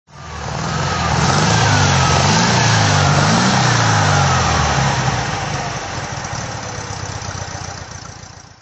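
356 speedster throttle, Porsche 356A Speedster 1600 T1, Engine sound; 1957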